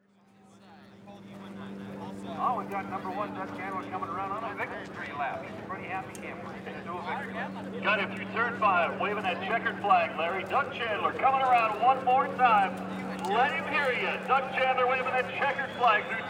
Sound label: superbike reaching